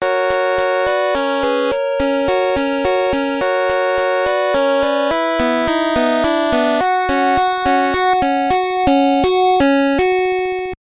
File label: Victory; Ikari; SNK Playmore; Famicom